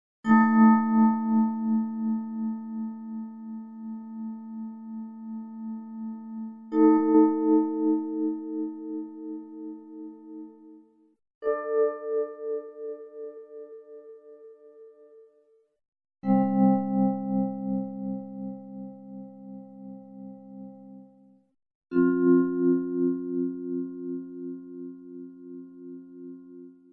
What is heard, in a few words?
xylo, Roland JV880; Vibraphone: mystery